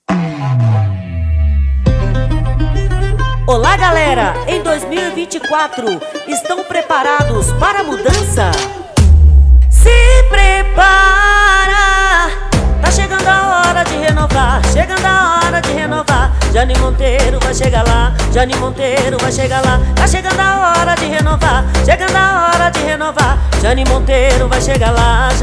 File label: Boiadeira Jingle